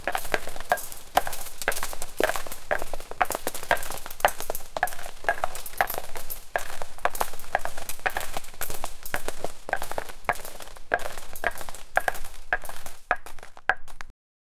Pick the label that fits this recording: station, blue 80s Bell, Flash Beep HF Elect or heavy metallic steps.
heavy metallic steps